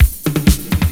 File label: Fill Ins 128BPM; Vengeance Samples